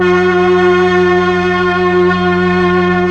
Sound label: STR, STRING